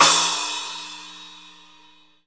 crash, dry kit

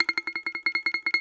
BAGLAMA T1JL; E-MU Producer Series Vol. 7 – Old World Instruments

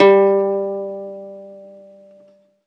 ZITHER G, Alpine Volksmusik

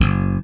SPECTOR PULL F#1, Bass; Sampleheads; Will Lee Bass Library

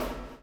CHAIN; Spectrasonics - Backbeat

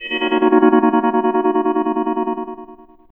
CHORD, Drum & Bass, 130 BPM; Ueberschall; Drum & Bass Resonance

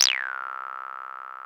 303 SAW; CMP - Analogon

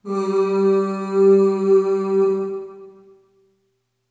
Ooh Short G2 Short Reverb; Harmonic Building Blocks

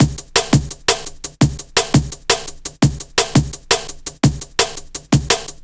Jazz Break, Drum & Bass; Fluid Dynamics

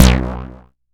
bass stabs; FutureRetro FR777

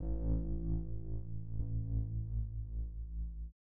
Bass A, 132bpm; Construction Kits; Bass 4; Big Fish Audio - Old Skool House; OSH Belgium Beats

